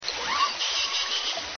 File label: Sxx, orca call